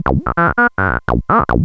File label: square